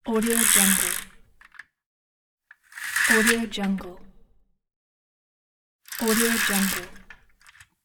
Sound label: sliding curtain